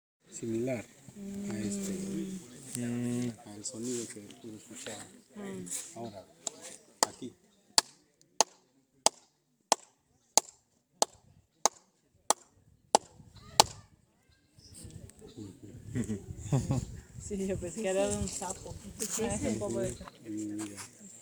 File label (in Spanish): Pajaro carpintero